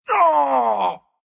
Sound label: pain, ru; dota2